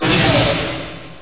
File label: Button 3D; evilcore